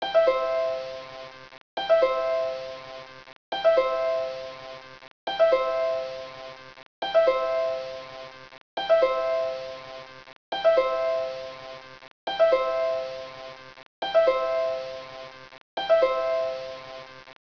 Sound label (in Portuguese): harpa Loop